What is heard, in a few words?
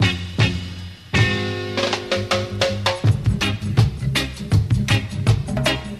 guitar raggamuffin, Loops, Phonky